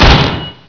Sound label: hit bod, weapons